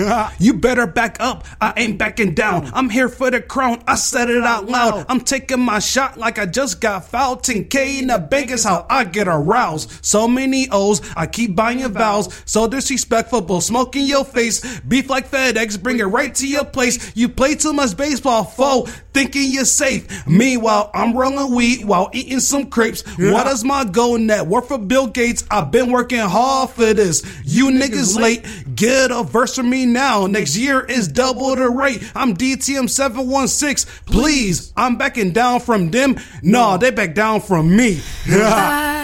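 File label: rap sing male female, 120, Bb major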